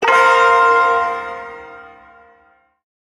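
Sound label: Ersatz Shawzin Pentatonic Minor Chord K; Warframe; Duviri Ersatz Shawzin; Chords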